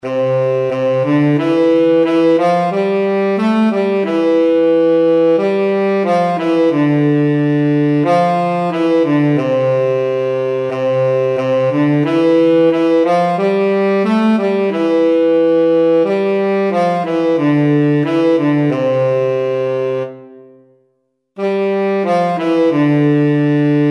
long long ago tenor saxophone

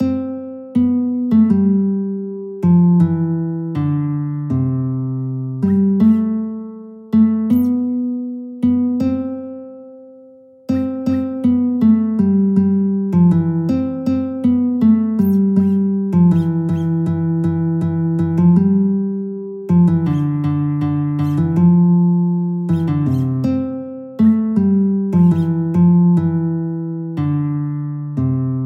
christmas tablature melody, guitar; joy to the world

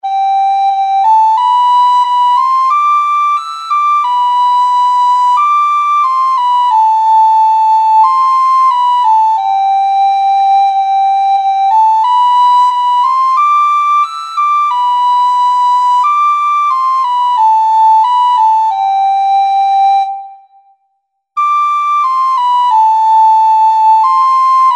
soprano recorder; long long ago

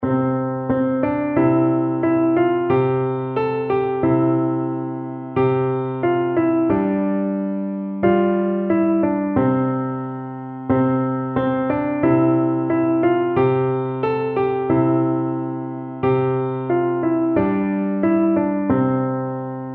beginner piano; long long ago

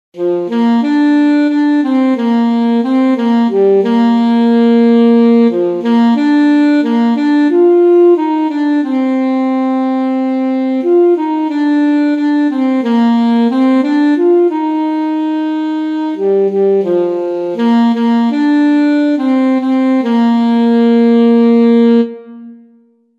alto saxophone; red river valley

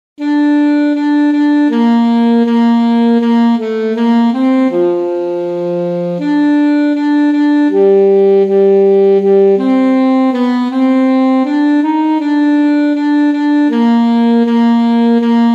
alto saxophone; rosamunde

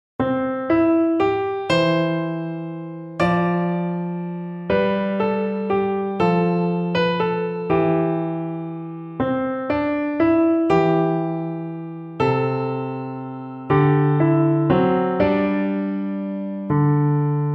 morning has broken easy piano

